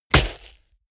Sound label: body fall sand hard; krieg-waffen; nahkampf; soundpool